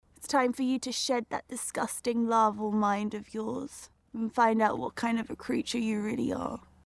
creature; mia goth

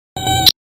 beep; moby

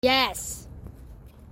yes duck

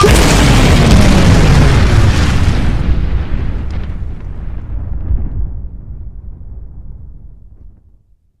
explosion sound

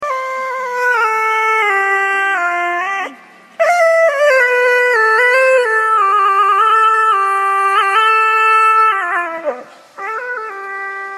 autotune dog

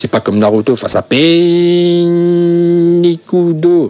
paaaaiiinn; rikudo

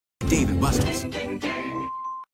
ding ding ding; dave and busters

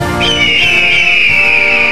short eagle screech

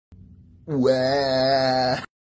waaa cry

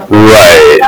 warning

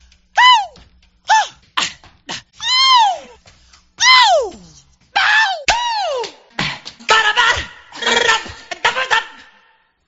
grunts

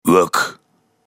wuk

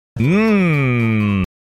mmmmmmmmm

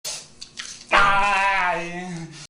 aaaaaaaaaaaaaaaaaaaaaaaaaain

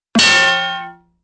drum and clang

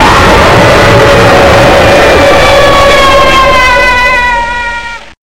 jumpscare; fnaf plushies 4 origins

